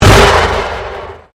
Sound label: Convolution Pulse; Sound elements to create more complex sound effects; Swell Sound Effects; Sound Design